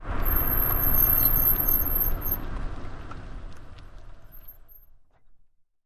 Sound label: Car Break Squeaks St, brakes